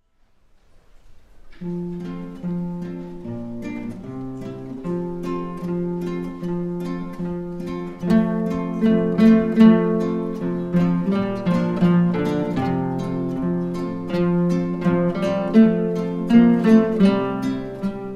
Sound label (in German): Vorspiel Gitarrengruppe; Schoen ist das Leben